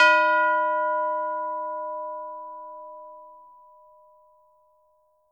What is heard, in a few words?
tubular bells hit B4; AO bell